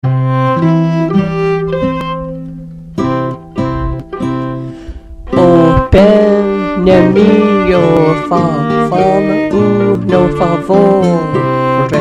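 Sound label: Tenor Words; O Bene Mio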